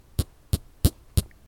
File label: small metal balls crushing against each other